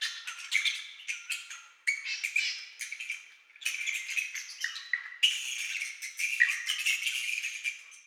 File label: ambient; World of Tanks